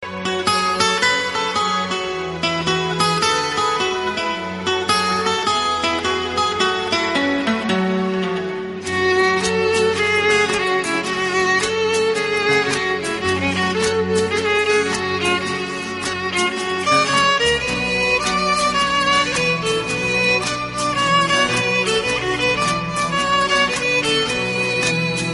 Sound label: Violin Starter, ringtones